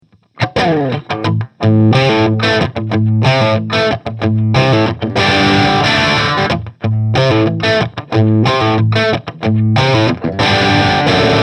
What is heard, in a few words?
Medium Crunch Heil PR30 Celection 65 Watt